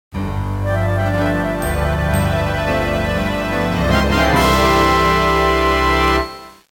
Fanfare; Leisure Suit Larry 7 - Love for Sail; Sierra Fanfare

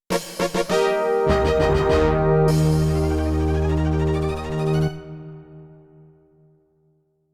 Dogfight Target Hit, MT-32; Wing Commander I

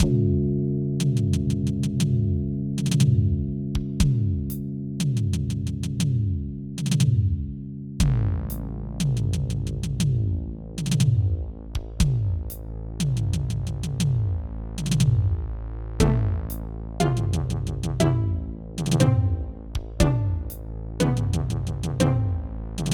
Suspense; Wolfenstein 3D

